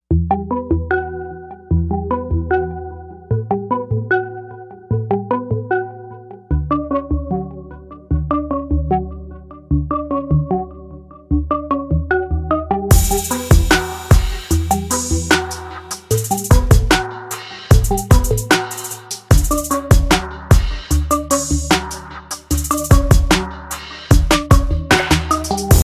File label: Instrumental